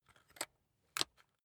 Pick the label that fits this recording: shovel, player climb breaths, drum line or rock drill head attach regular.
rock drill head attach regular